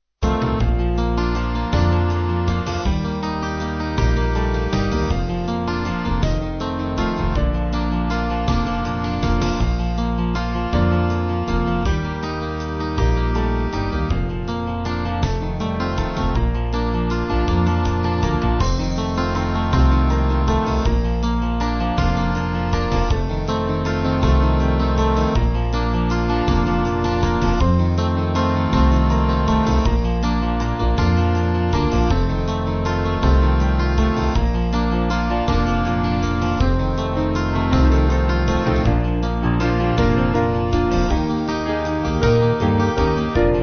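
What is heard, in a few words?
Band, Music Backing Files for Churches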